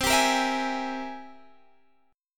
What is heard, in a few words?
C 7th Flat 13th C, chord